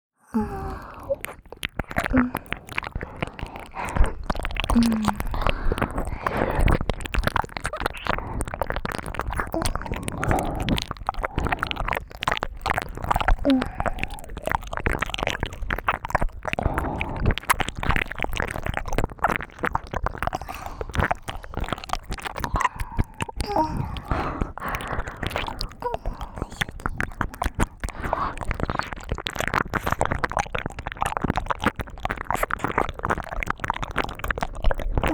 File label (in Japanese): 両耳舐め, ASMR; ロリメイド×人妻デリヘル